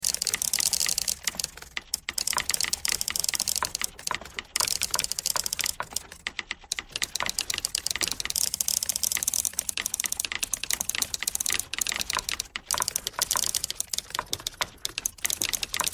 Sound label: typewriter thread all